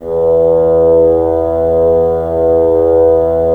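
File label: wind bassoon; LA Composer vol. 3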